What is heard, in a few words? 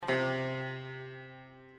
sms